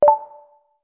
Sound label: unlock; duckstation